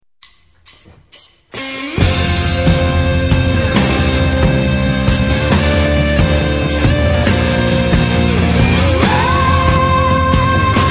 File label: bright